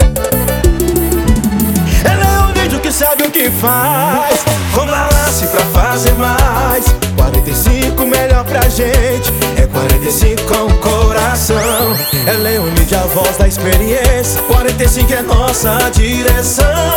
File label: JINGLE PISEIRO MIX; LEONIDIO